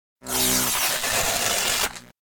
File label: Electric Arc Short Circuit Electric Shock; Hollywood Edge; sound designer's toolkit v2